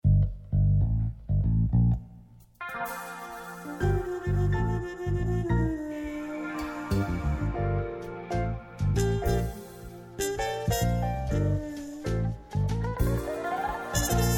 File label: harmon; Hajduk